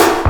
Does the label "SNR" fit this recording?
Yes